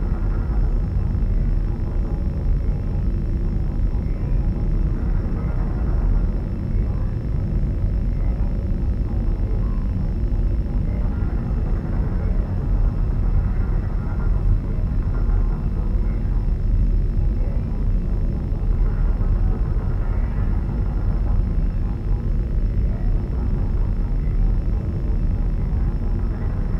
ship destroyer idle; ships; Stellaris; shroud

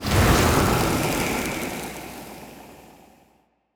toxic planet, ambient; Stellaris

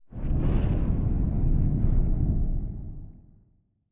city planet; Stellaris; machineage; planets

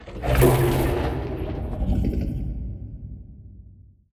void worms mature attack; Stellaris; grand archive; ships

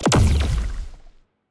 evasion buff hit, weapons; Stellaris; biogenesis

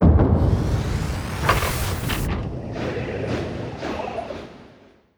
notification war; Stellaris

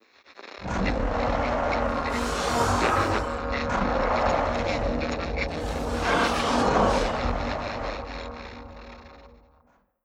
ai crisis room hits; Stellaris; synthetic dawn

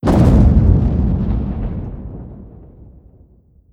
station explosion large; weapons; Stellaris

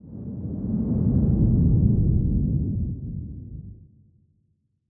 kaiju adult tail swish long low; biogenesis

